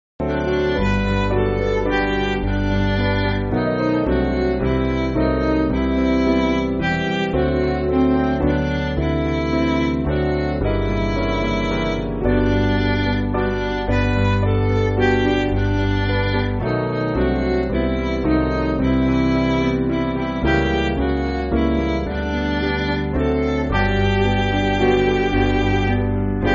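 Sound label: Midi, Music Backing Files for Churches; Camp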